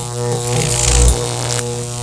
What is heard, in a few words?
ELECARC; Shadow